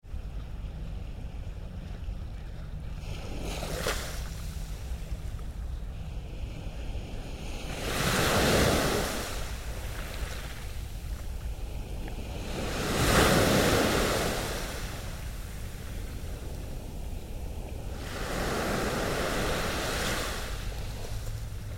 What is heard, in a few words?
sea sounds